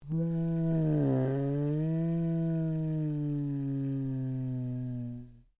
Created Creature Large Exclamation, Alien Sound Effects; Created creatures, vocalisations and pretend speech